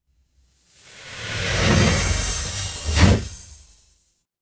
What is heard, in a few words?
magic scifi portal